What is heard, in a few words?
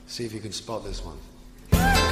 woo; spot